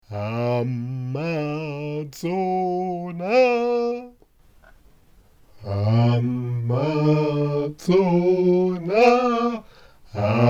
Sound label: Akai MPC 2.10 Doubler